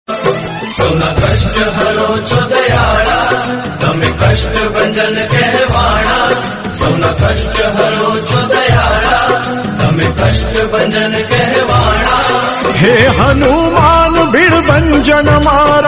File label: devotional gujarati